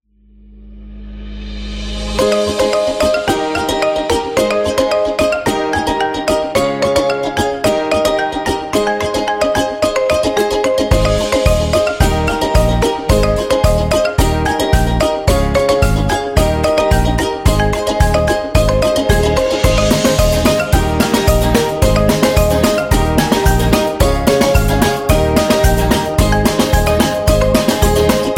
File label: Fun Commercial